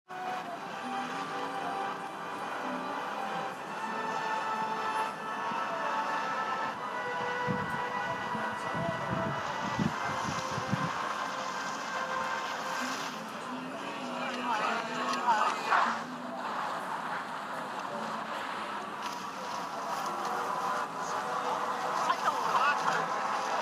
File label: Bass Test; Tiamat 7.1